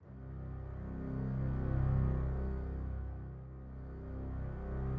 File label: game over continue; metroid